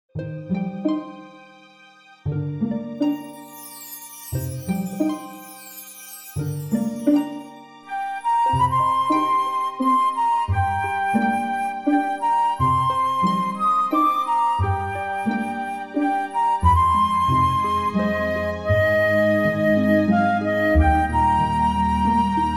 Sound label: play flute reference; Shrek